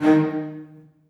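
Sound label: celli staccato d#3; Sonatina Symphonic Orchestra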